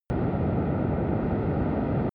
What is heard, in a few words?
drone spaceship large